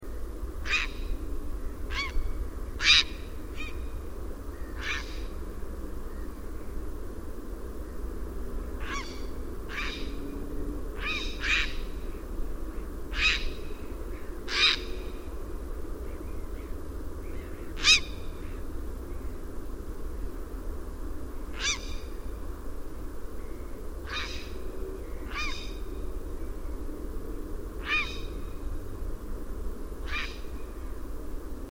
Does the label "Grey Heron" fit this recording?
Yes